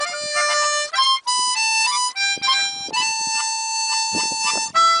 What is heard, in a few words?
Harmonica